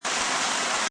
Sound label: Waterfall Loop Short, Small waterfall; seamlesslyl looping sound, short version; Rivers; Lynne Music